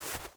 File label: footstep burlap snow run; Rust